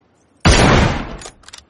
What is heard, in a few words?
m24 ammunition sound